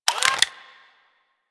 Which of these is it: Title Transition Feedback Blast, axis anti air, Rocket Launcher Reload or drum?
Rocket Launcher Reload